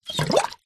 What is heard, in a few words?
household water in metal pot bubbles blow short